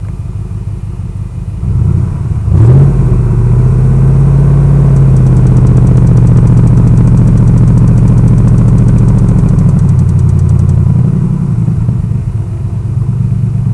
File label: tick